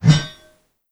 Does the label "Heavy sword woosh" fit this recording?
Yes